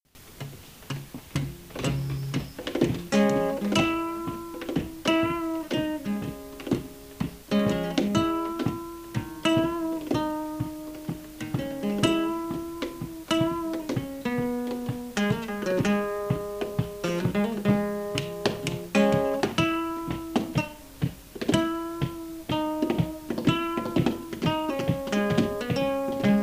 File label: Bb drum